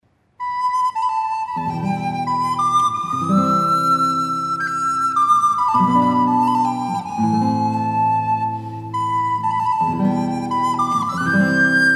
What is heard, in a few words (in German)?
irische folk suite, Zupforchester